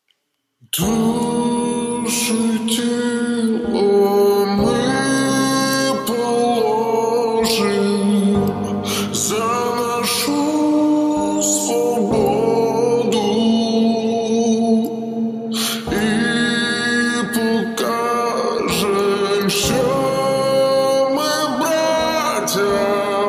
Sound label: slowed reverb